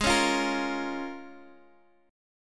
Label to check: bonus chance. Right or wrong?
Wrong